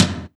TOM, Eurotech; Sounds Good

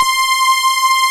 SUPERSAW, synths; Pro-Rec - Synthsonic

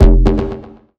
Bass F, Bass Synth

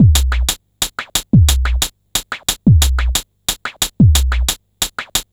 tomsquash 090bpm; Zero-G; Downbeat Dance Grooves

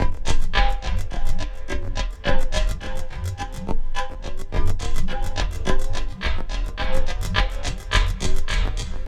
Terrestrial Hybrid, Processed Percussion

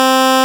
P5 PUL, PLS, Vintage; INIS - Vintage

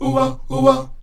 Oh-Wa Oh-Wa, Afro Chants; C 3; Roland LCDP11 Africa VOL-1

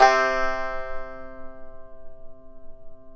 TAR; Roland - LA Composer vol. 3

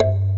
KALIMBA; Pro-Rec - Synthsonic; TRINITY

